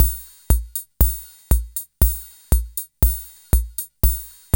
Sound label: SWING; INIS; Funky Xtreams